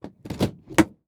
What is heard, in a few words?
Helicopter Door Open Interior; transportation